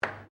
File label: AIR HOCKEY REBOUND SINGLE, Air Hockey table; Games